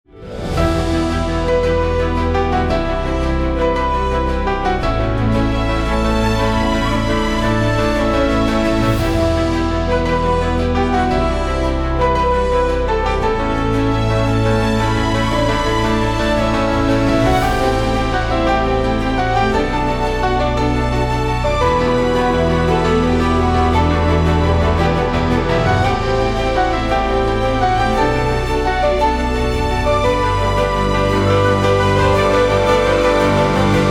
piano and orchestra; episch-heroisch

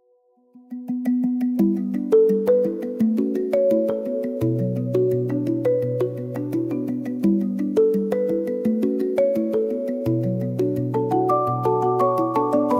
Subtle Signs, percussion

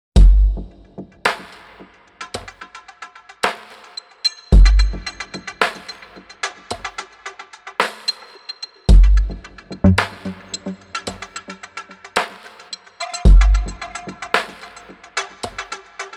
rhythmic, suspense

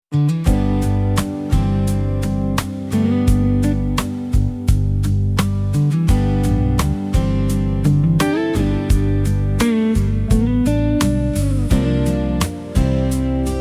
Country Stroll, percussion

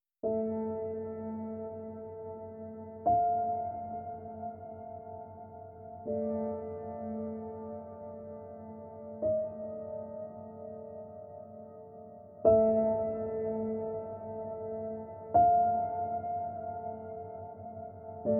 piano

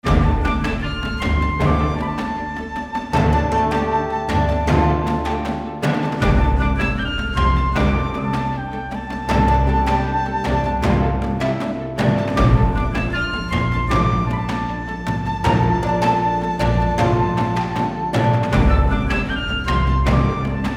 flute

